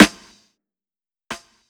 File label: SD FX, Hip-Hop & R&B; USB Soundscan vol.47; AKAI